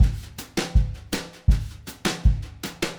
Drums, Jazz

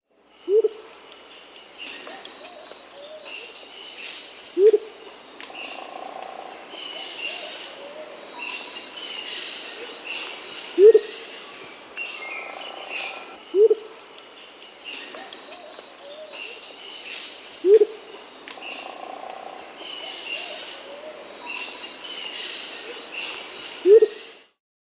Blue-crowned Motmot (Momotus momota), Peru; S. America

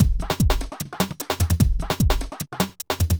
murder 150

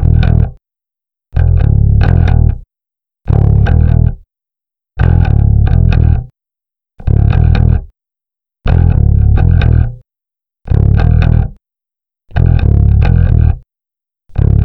Bass, Rock

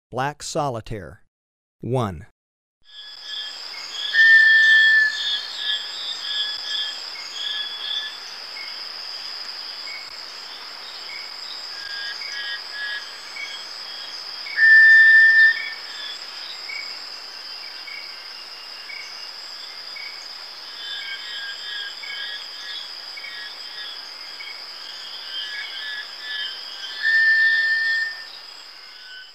Black Solitaire, Birds of Ecuador; S. America

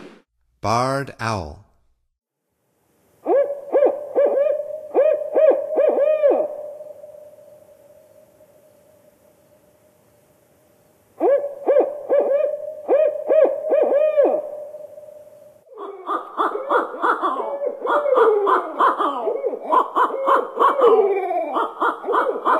Barred Owl, Songs of Birds of North America; Stokes

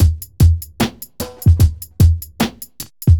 hiphop

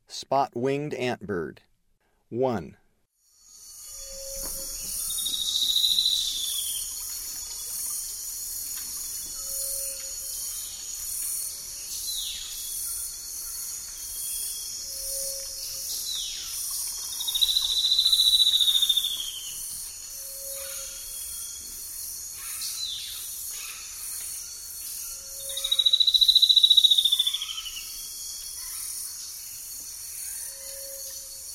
Spot-winged Antbird; S. America; Eastern Lowlands

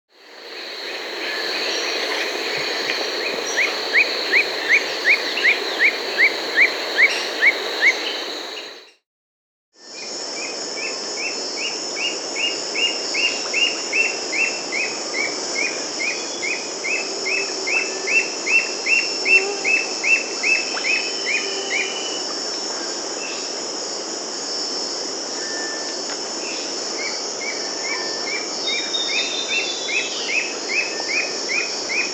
Rufous-capped Nunlet (Nonnula ruficapilla), Peru; S. America

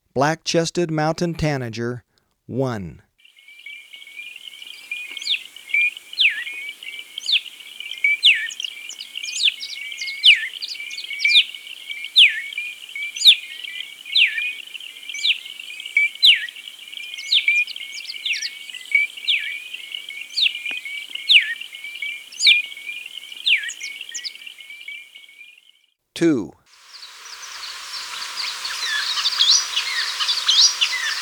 Black-Chested Mountain-Tanager, Birds of Ecuador; S. America

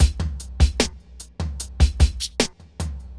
evil beats

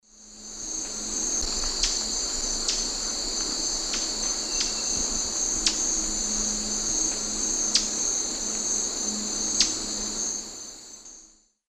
Campylopterus largipennis, flight calls; S. America; Eastern Lowlands

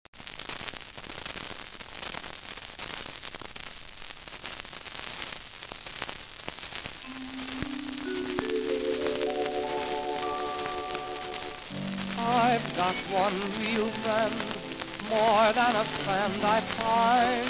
vocal, Jazz; Clarion; Popular Jazz Archive 1920-1936

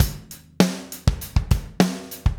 Drums, Rock

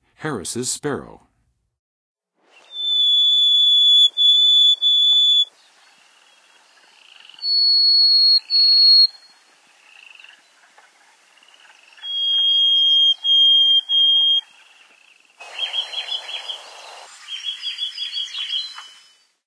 Harris Sparrow, Songs of Birds of North America; Western USA; Western USA Songbirds

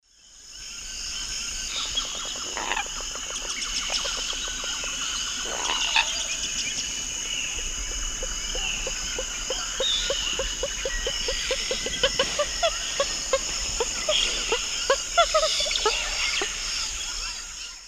Gallinula chloropus, calls; S. America; Eastern Lowlands

